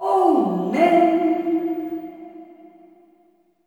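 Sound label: LULLABYE, SOLO FEMALE; Spectrasonics; Heart of Africa 2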